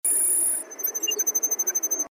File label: front camera science fiction up; Allview Soul-X6-Xtreme